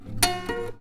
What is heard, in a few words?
Classical Guitar Double Note Plink; Classical Guitar Extended Technique Stereo